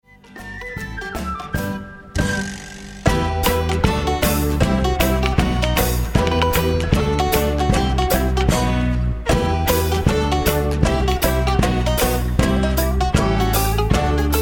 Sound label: Banjo